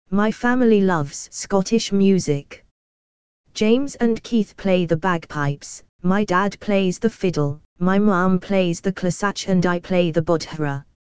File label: scottish music